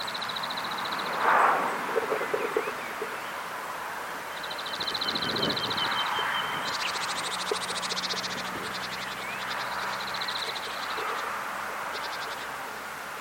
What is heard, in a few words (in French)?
pipit arbres mesange charb